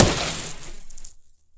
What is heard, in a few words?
hit water; weapons